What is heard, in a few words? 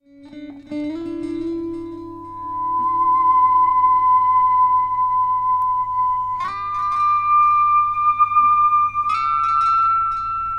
DI recording; vibesware gr junior